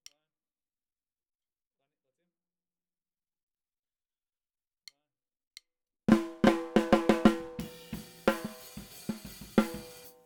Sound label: SNR